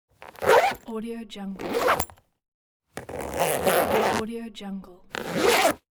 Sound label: zipper